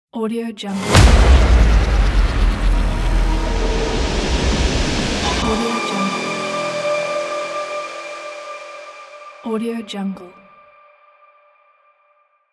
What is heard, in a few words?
aggressive cinematic logo; phillipmariani